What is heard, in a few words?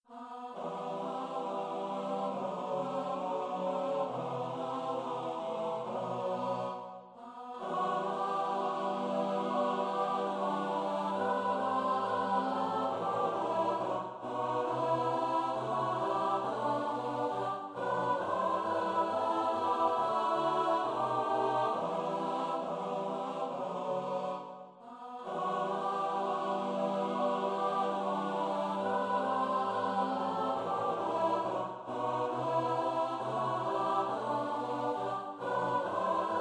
SATB; BEHOLD OS HERRE VED DIT ORD